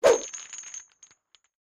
logo Down; wild wild west

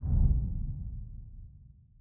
reel stop; rise of maya

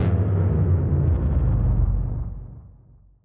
energy Meter Shut Down; elements

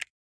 button Hover Away; silverback

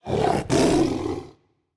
panther fx high; serengetikings

